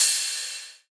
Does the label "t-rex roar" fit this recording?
No